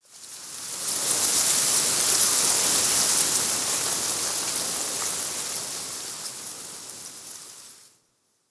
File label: Forest Wind Soft Leaf Gust; Warframe; Grineer Forest